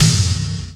pyro snare; Greytsounds; F2 SNARES

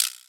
EQ Perc, Percussion Mix

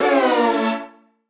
Poly Chord Hits, Ramp Down, future rave

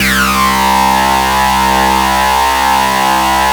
SUPER FUZZ, Synthy Tonal; Distored Reality

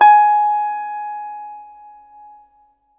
SITAR AB6; Spectrasonics; Heart of Asia; INDIAN SET

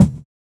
Kick, jungle breaks drum sounds

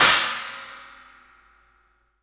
CYMBALS; 3D Sound; 3D Drums

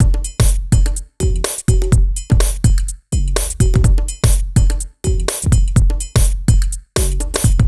Full Loop 125, Loungin House; Equipped Music

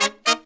Horns, 110BPM; 90 s Sample CDs; Zero-G; Phantom Horns

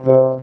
Talk Box "thu"

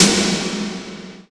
CYBER KIT, DRUMS

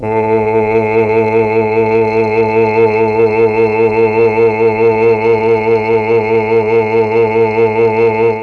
A1 CHAMB MAL, Mellotron, MALE VC; Mike Pinder - Mellotron Archive CD; CHMB MALE VC

